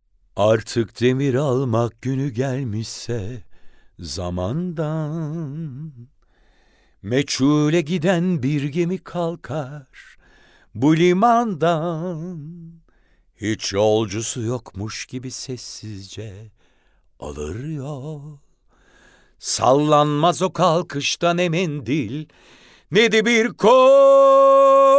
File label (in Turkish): vokal